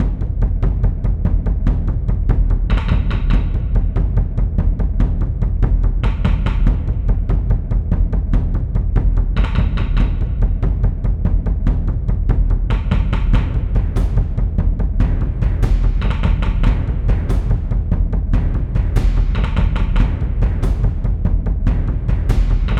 Intense Loop

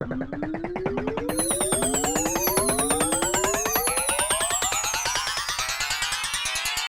Cartoon; The Natural World E

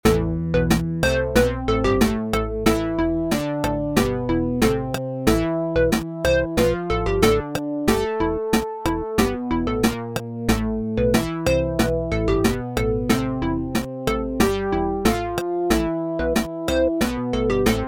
8-Bit Quest; Deponia Il Giorno del Giudizio; colonna sonora

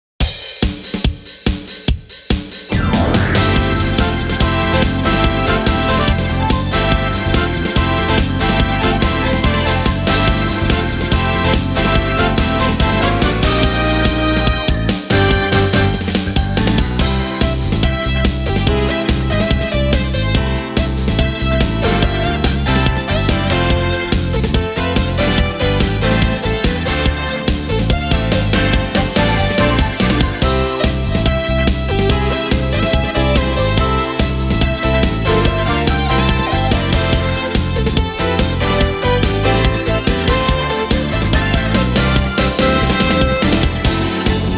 Game Victory

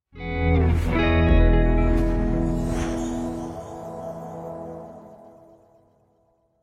Completed; Rocksmith

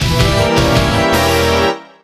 Jingle Battle; Monokage Quest; 3DS

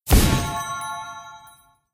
Magic Portal Exit; Angry Birds Seasons

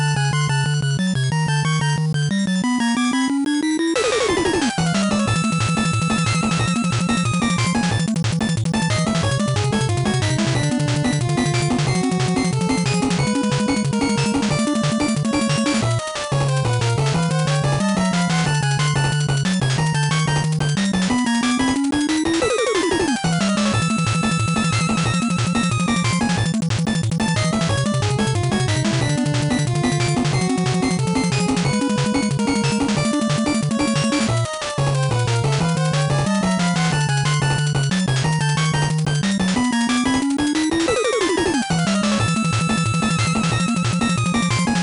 Boss Battle; Rockman 8; Famicom NES Windows

